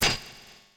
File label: Bonus Scoring; Super Smash Bros